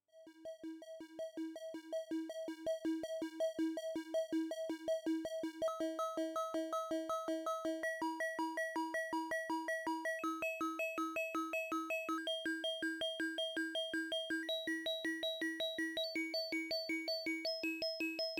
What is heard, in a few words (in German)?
quad operator Harmonische und Subharmonische